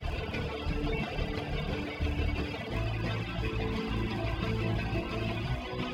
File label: Groove DUET